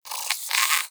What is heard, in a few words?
Rodent Vocal Snore